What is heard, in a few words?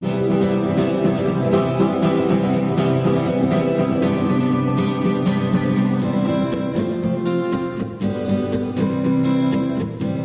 Echo + reverb